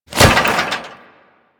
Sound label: Restrained Foley; Warframe; Solaris; Solaris Worker